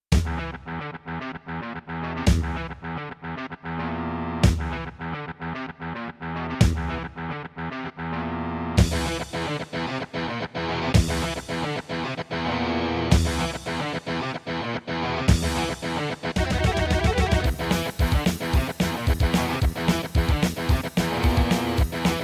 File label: Roland SC-88ST Pro; Doom